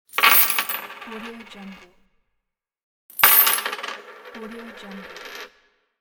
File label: coins falling